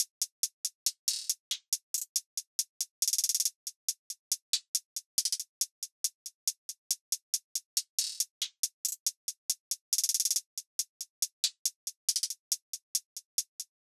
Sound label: Hihat Loop - 139 BPM; trap; Drum Loops; Dope Hihat Loop; Cymatics; Trap Starter Pack